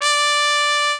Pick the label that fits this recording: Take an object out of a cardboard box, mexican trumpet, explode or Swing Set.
mexican trumpet